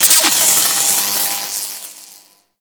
Slam Electricity; Cinematics; Teshin Intro

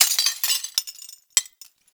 Desktop Glass Destroy; Ambience; Gameplay; Warframe; Entrati

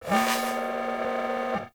Animal Foundry Working, Machines; Liset Machines; Tenno

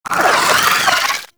Vocal Attack; Amalgam Moa Satyr

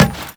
Run Metal, Footsteps; Warframe; Teshin

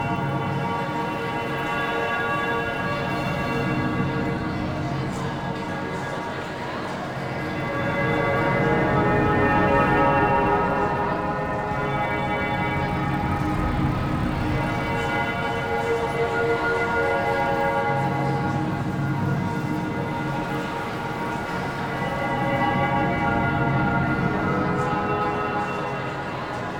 Interior Orb Station Amb Bed; Warframe; Tau; Orbital Station

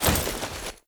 Tenno Vault Up Generic, Foley; Warframe

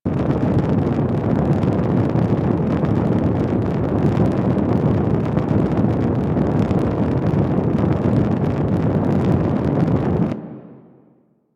Mine Osprey Jet Loop

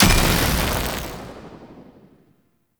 Fist Spark Explosion; Enemies; Warframe; Tyl Regor

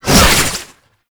Whip Whoosh; Warframe; Oraxia Whip; Weapons Melee

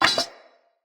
Torch Place Drop; Torch Puzzle

